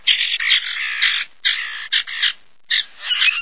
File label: Quiscalus quiscula; Chordata; LandBirds